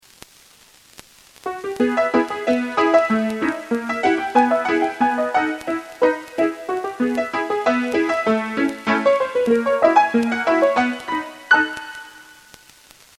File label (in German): Grammophon Piano